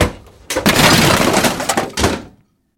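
crazy crash of paint cans falling from top shelves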